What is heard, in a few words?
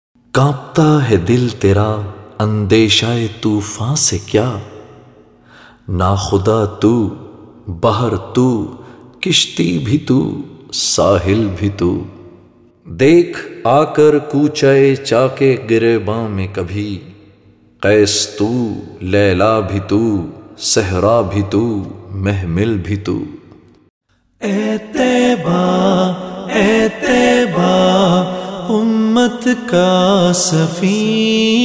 Arabic